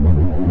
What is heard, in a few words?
phasemissile; Tanks